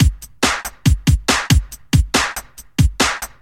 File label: Breakbeat 140 BPM